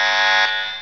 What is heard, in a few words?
buzzer